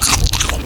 Food; FlyForInsanity

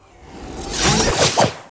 Fast attack; Pc Skill; FlyForInsanity

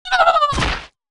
Imp die; FlyForInsanity

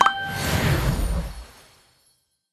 refill lives button; Candy Crush Friends

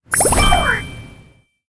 octupus appear sfx; Candy Crush Friends

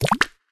cookie appear; Candy Crush Friends

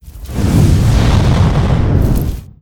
Fire master; Fly For Insanity

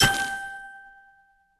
match sequence sfx; Candy Crush Friends

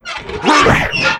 Steel Knight idle; FlyForInsanity

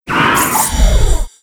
Wind cutter; Pc Skill; FlyForInsanity

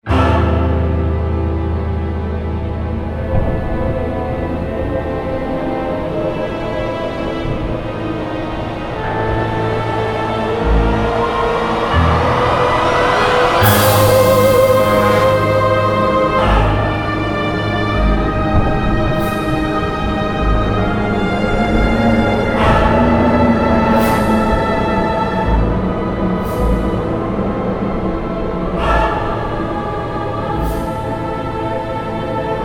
Bgm NPC Horror; Fly For Insanity

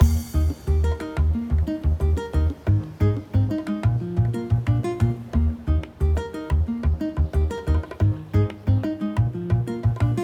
menu; Cooking Fever; Nordcurrent

